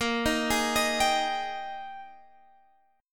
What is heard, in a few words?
Ab Major 7th Suspended 2nd Bb, Guitar